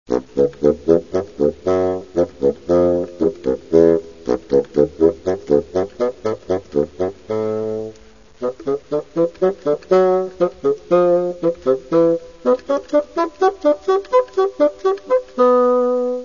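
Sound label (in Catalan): fagot, instruments de vent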